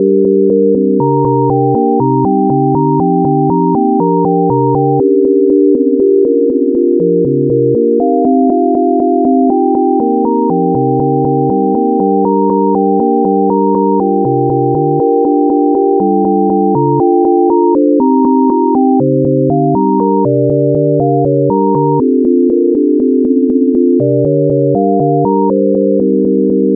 sine minor blues; Minor Blues Scale; music generation library in Rust

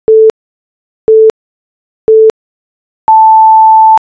time signal

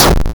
lose; flixel; TurnBasedRPG

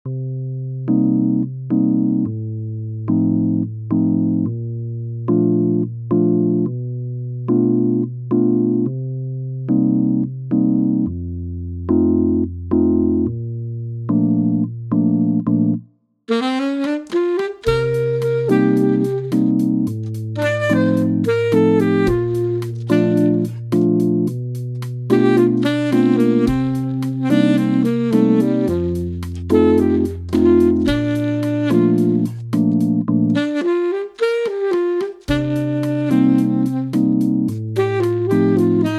pigeon jazz

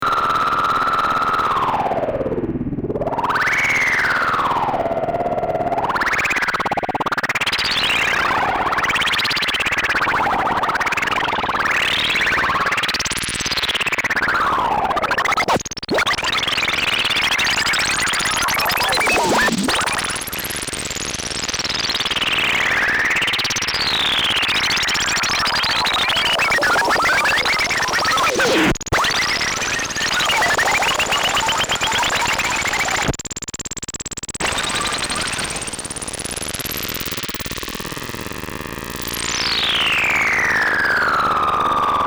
drippy drops

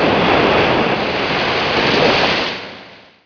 muddy water; Pogo

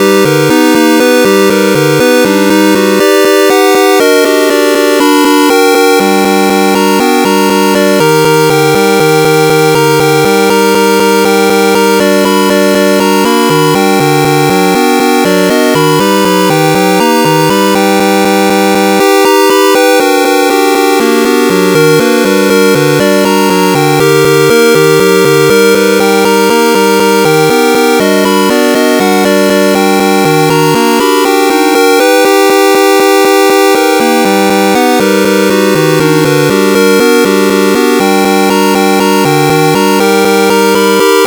square augmented; Chiptune Augmented; Augmented Scale; music generation library in Rust

